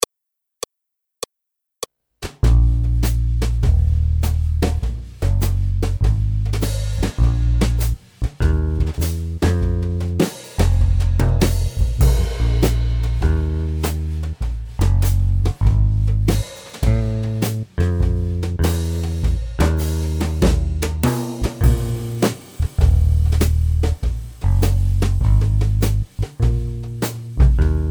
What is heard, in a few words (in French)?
Accompagnement seul